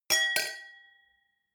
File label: wine glass different clink bounce dull medium, gastronomie; berufe; soundpool